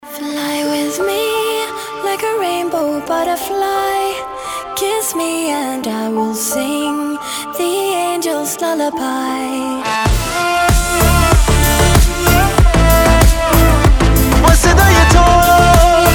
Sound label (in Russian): Мелодия любви